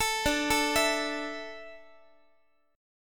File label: D Suspended 2nd D down Guitar, chord; x,x,12,12,13,15; arpeggiated